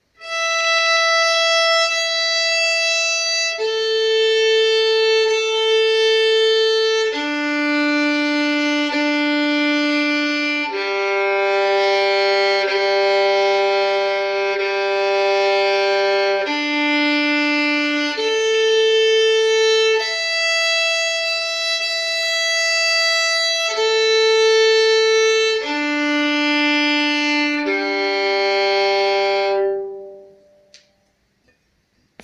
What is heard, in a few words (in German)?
violine